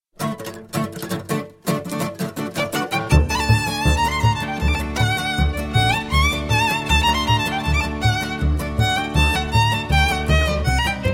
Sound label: Fiddle Der Heyser Bulgar